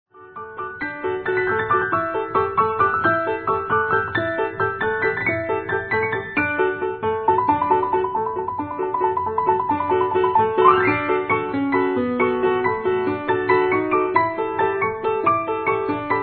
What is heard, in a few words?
Greek Melody